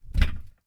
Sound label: FOOTSTEP - Metal Grill Land Barefoot Male; Barefoot on Metal; Timothy McHugh; GDC Game Audio Bundle